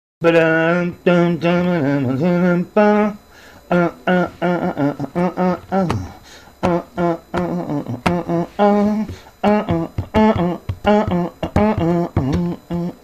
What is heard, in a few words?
tom